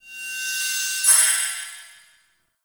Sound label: Glyph Prism Laser; Warframe; Duviri; Glyph Puzzle